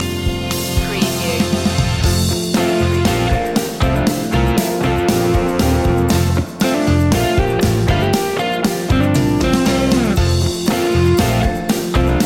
wake up pop

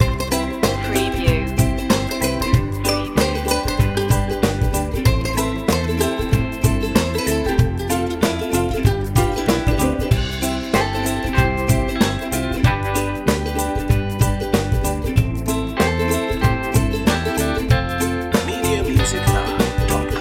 my happy ukulele loop

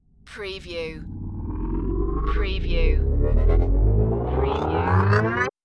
Crunchy Rise

